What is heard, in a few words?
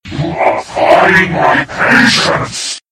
backstab; freak fortress 2; nightmare